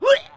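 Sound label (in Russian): короткий начинающийся рвотный позыв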